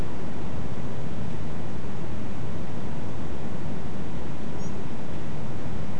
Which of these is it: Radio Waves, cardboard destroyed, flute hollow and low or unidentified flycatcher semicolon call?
unidentified flycatcher semicolon call